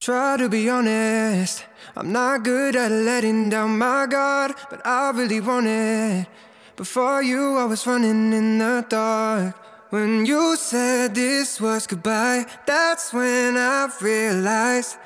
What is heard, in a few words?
Reverbsystem 9 Male Vocals